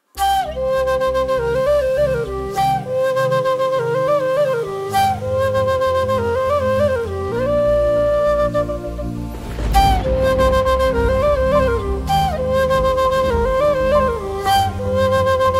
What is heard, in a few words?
Rain and flute